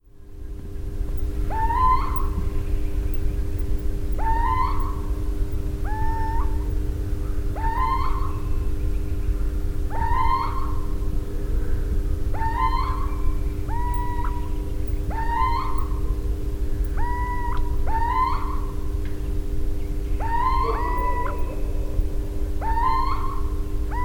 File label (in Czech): sýček vokální souboj samců